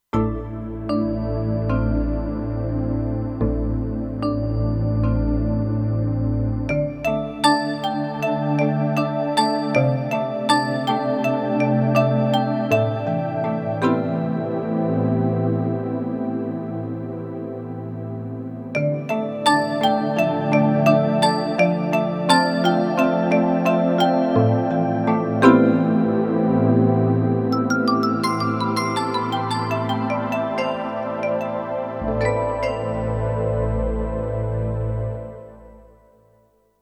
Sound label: Desert Soil, Kurzweil K2500X